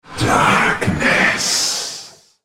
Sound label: ultimate effort; Nocturne